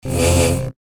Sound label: Saber; FXHome